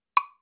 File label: Blast block